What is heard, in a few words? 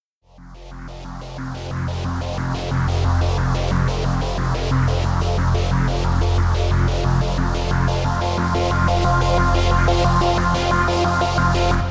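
sequence synth fade in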